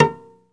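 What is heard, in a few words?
SOLO CELLO, Synclavier Strings; ILIO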